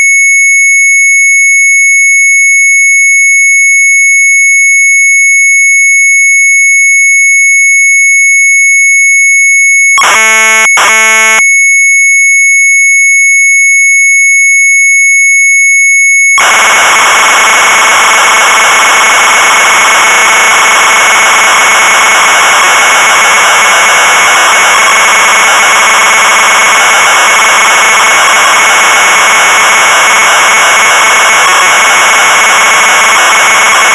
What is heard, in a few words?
MZ800 Tape, sharp; Magic River1; retromelsarchive